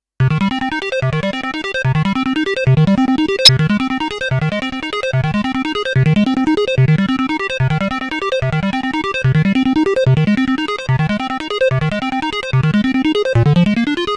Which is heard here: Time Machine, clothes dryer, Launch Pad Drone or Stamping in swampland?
Time Machine